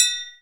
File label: percussion, Ethnic Perc